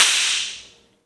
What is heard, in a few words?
CLAP SHRT; E-MU Producer Series Vol. 5; 3-D Audio Collection; 3D Multis; 3D Sprint Select 2